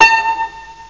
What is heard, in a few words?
Short Piano; Lead&Basses; MAXON-FTM